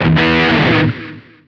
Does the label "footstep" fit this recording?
No